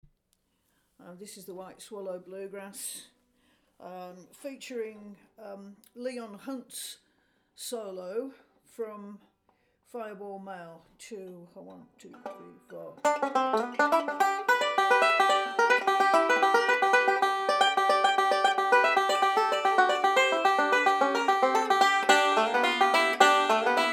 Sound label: Fireball, banjo; custom bluegrass 2020